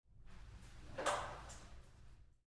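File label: Door Bathroom Stall Open Roomy Light Moves; Lite w-Lock; Bathroom Sound Effects; Big Room Sound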